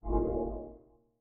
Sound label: GUI Sharp positive; the 39 steps